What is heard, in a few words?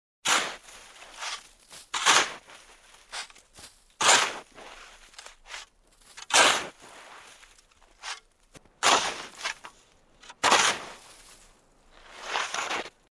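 lopata, shovel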